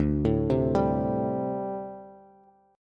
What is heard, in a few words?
D 7th Suspended 4th D Bass; arpeggiated; JGuitar